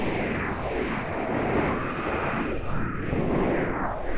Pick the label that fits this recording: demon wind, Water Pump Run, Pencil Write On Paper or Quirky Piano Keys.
demon wind